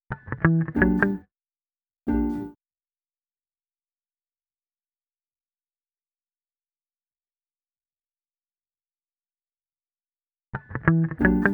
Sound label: Melody